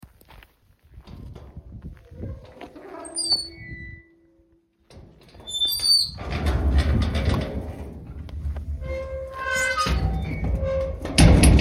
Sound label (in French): le portail bruits; VERRINES SOUS CELLES 79